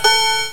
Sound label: Chime; FAIRLIGHT CMI IIX